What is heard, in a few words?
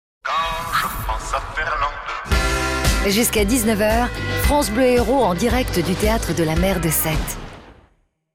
Jingle; Fernande Sète France Bleu Hérault